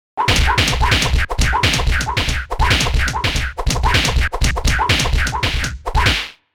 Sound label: Flying combat anime fight